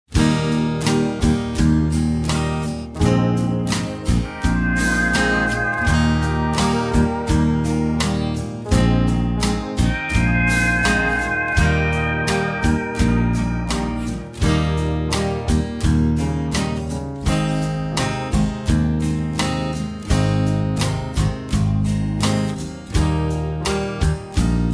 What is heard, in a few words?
No Backing Vocals; Eb